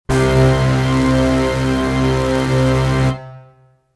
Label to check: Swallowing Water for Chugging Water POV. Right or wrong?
Wrong